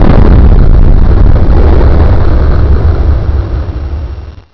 spike

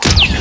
BANG; INTENSE

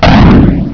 REBOUND; HIGHTECH

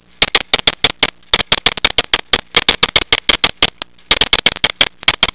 TYPING

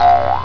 rebound; Bloobs

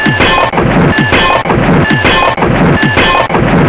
METALMA; HIGHTECH

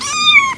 MEOW, SCARY

